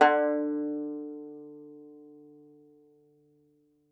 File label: Tenor Banjo, Plucked Strings